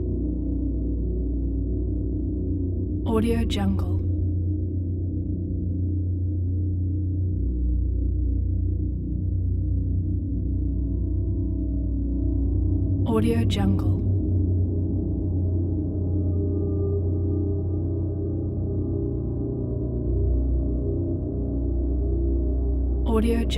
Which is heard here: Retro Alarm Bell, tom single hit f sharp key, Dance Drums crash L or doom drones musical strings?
doom drones musical strings